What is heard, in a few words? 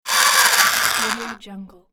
small electric motor short spin